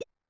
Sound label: collect; grunk; tools